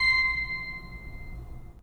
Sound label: 3D Organs Pipe Mix Celeste